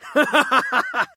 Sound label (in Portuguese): hahahahahaha risada; sieghart